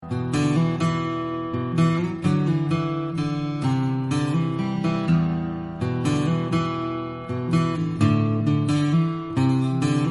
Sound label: nice melody